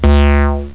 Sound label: FM 202 Bass; MC202